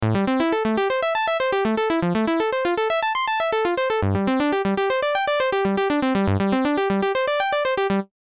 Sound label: retro synth arp